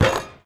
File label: Street Ball Glass Basket; SFX Kit